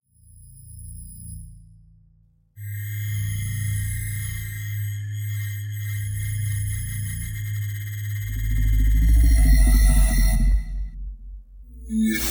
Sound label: synth; Synth2